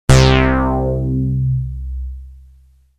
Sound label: Moogli-Bass